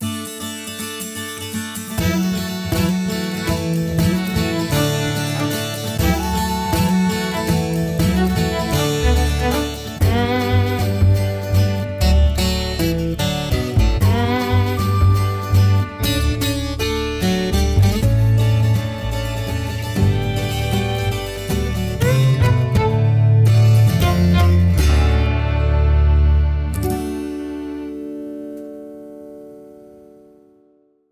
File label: Fiddle Fingers